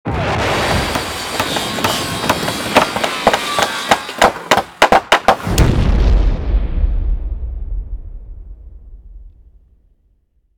shelter build; Original TK Sounds

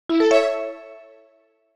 start; NVDA; NVDA Ericson Sound Theme

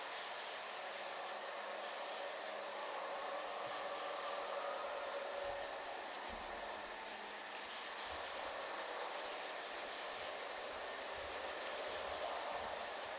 eerie; Golden Crayon Sounds

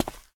concrete step; Original TK Sounds

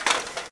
fence step; Golden Crayon Sounds

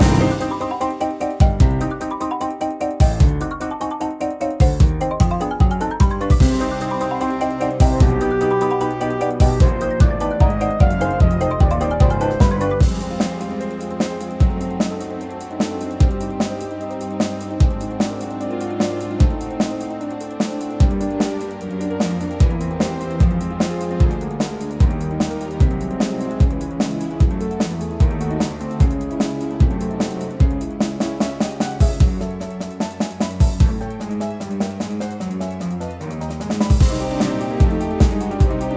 encounter insect collector; Manamon Music; Manamon 2

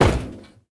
metal step; Golden Crayon Sounds

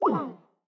Error; Skype

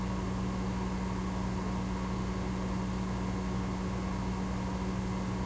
room tone; Golden Crayon Sounds

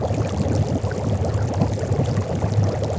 boiling; Golden Crayon Sounds

